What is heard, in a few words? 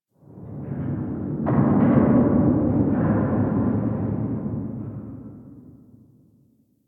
Distant Eerie Metal Bangs; Warframe; Ambience; Nineteen Ninety Nine